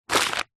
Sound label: mail bills paper small pile set down on table; foley; zapsplat